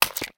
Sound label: hit bomb egg